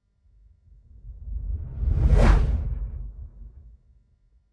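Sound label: cinematic sound, softness and smoothness of sound; SFX / эффектные события (взрывы, стекло, удары)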